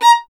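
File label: STR violin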